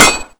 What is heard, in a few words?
hit, crossbow; weapons